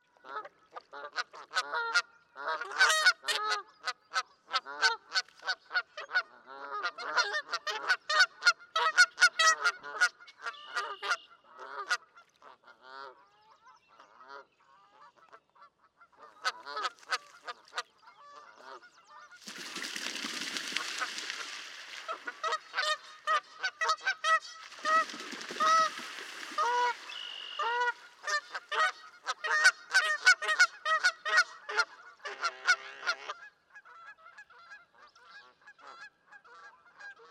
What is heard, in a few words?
Canada Goose